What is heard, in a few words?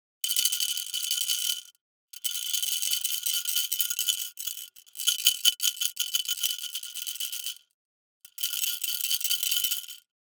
DICE in Plastic Cup, Shake, Mini, Eight Dice; Samples; ArticulatedSounds; DICE Sfx Party (Extended)